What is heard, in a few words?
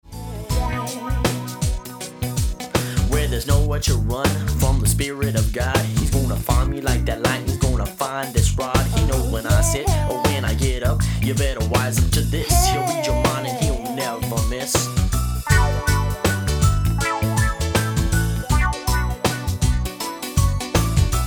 Psalm 139 Rap